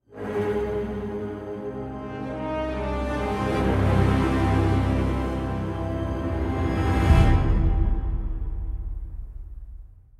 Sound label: Victory stinger; BattleLore Command